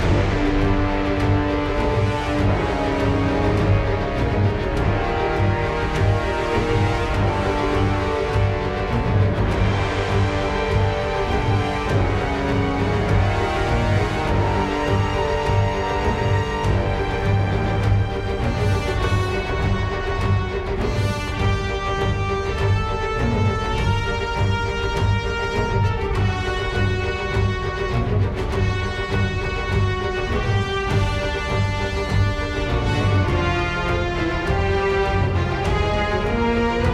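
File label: background music battle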